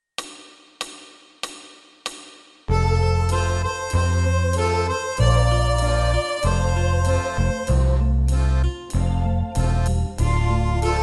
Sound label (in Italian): Fisa tutti